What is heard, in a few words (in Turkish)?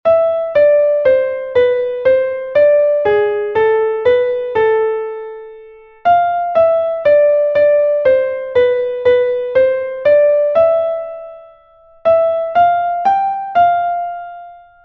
vals